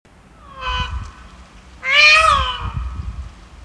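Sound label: pfau, peacock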